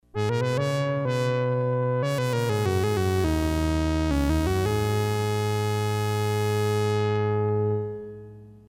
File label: Saw Stack; Kris Rhen Presets